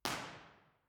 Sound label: central hall; central hall university york; b-format